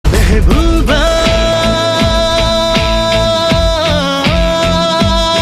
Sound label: Male Ringtone